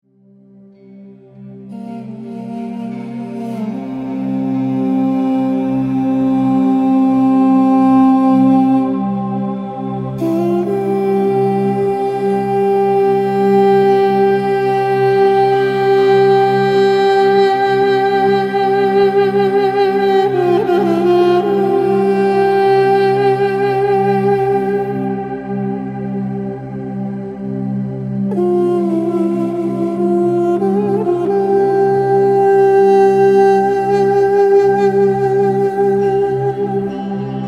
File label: Peaceful Flute